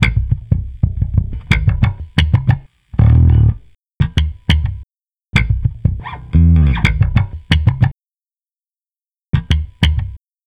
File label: Electric Bass